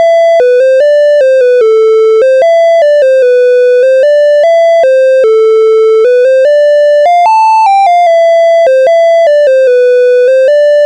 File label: tetris raw triangle; Python